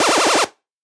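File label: phone notification sounds in 8-bit style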